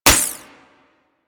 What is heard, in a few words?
Sapient Pistol Mech; Warframe